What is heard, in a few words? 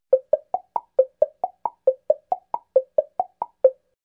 Woodblock Arpeggio Up